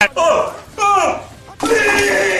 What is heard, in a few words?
puking; jontron